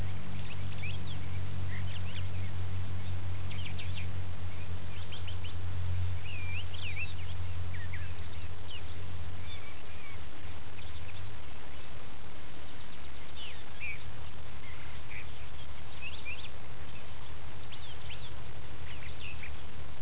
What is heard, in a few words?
birdsong